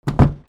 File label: DOORS